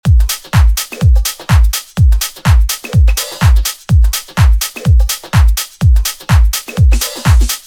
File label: Drums Loops Techno House